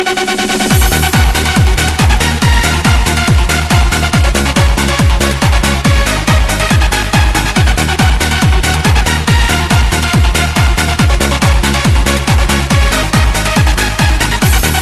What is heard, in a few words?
Dream Trance